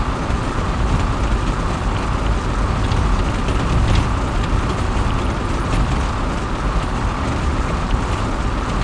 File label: Car Skid Grass Dry; NASCAR Thunder 2003